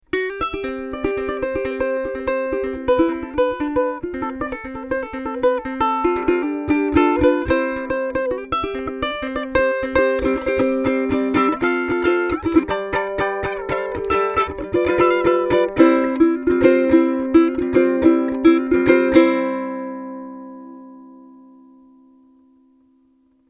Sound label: with pre, ukulele